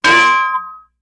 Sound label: Ding, metallic hit; chimes & bells